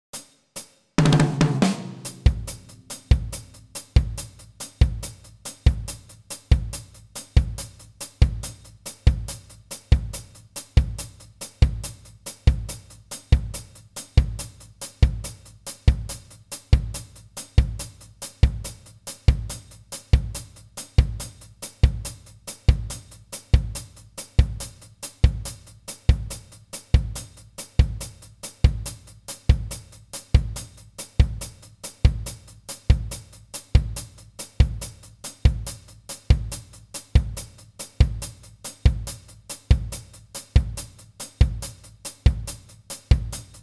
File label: drums verse